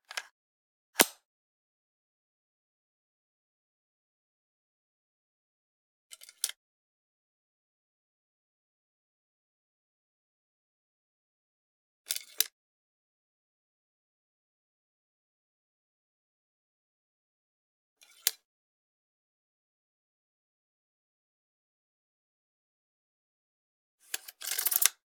.22 Rifle Work Action, Load; Hollywood Edge; Heavily Armed

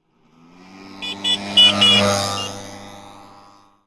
motorbike horn; Blind Drive

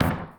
Street Ball Glass Back

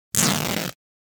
Fabric Tear Rip - Foley Cloth Movement; Hollywood Edge; sound designer's toolkit v2

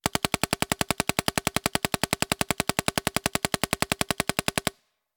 Paintball Gun; Sound Ideas; Guns

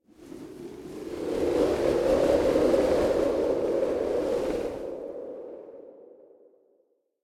Wind Gust Short Passing Whistling Wind; Hollywood Edge; sound designer's toolkit v2

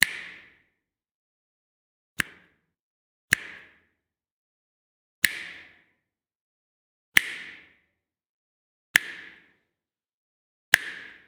snaps; human; NS Studios - ultra sound collection

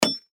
dice click; Dice World

